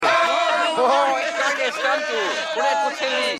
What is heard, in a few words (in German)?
lachen